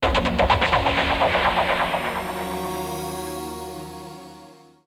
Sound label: clicking Echo